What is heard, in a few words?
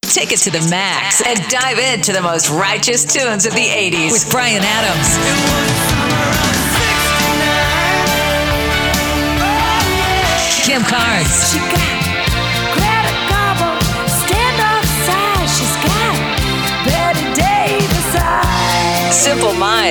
80s CLASSIC HITS